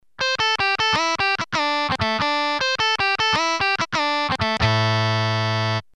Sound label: lick